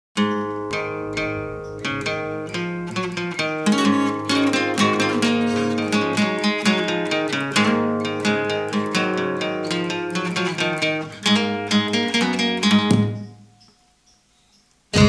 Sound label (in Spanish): Guitarra acustica Medieval